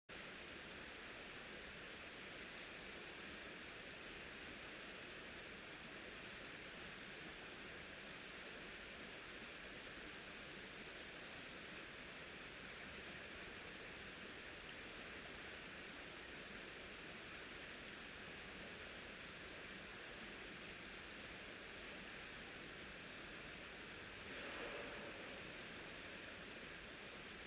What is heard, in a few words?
riverside; Kyoto U; Ashiu